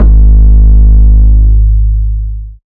808 Bass Aggro Ab3; Slate 808 Deep Dive; SD Signature 808s

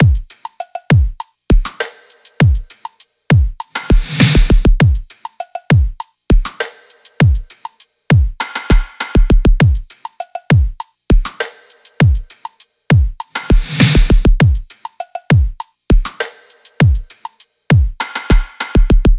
drums 100, Drum Loops, Full Drums; Slate; MIDNIGHT Sample Pack

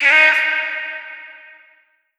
Omnivox oneshot Eb, Vocal; One Shots; Slate Digital Omnivox Vocal Sample Pack

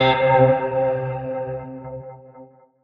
tonal oneshot C; Pop; Slate; PASADENA Pop Sample Pack; Tonal One Shots

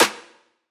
snare oneshot, Pop Sample Pack; Drums; SD PASADENA Pop Sample Pack; Slate

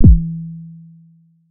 Synth oneshot Short High E, Synth Bass One Shots; Slate Digital BASSHOLE Sample Pack

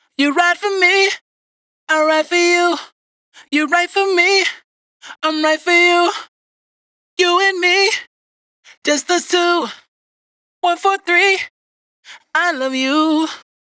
Dry Vocals hook Love you 140 Eb; Raw Vocals; Slate Digital Omnivox Vocal Sample Pack

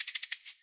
percussion oneshot snake; Pop; Drums; SD PASADENA Pop Sample Pack; Slate

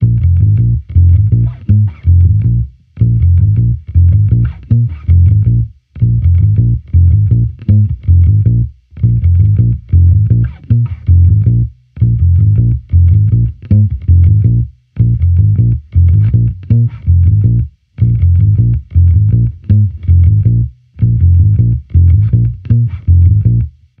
Amped loop 80 Bbm, Electric Bass Loops; Slate Digital BASSHOLE Sample Pack

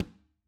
conga Single Hit Mute; Raw Perc One Shots; Slate; SD SEISMIC Drum Sample Pack

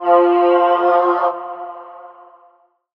vocal tunnel, F; SD AURORA Sample Pack; Slate

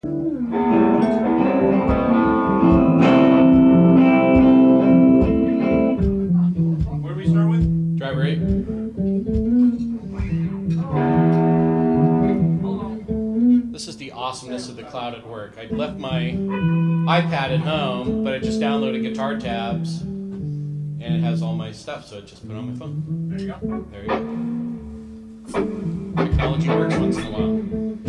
Background Mix

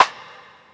snare cave; Drums; One Shots; Slate; AURORA Sample Pack; Snares

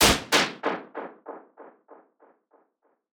perc tin can; one shots; SEISMIC Drum Sample Pack; Slate

